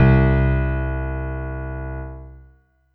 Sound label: Yamaha EX5 Rocking Bose C3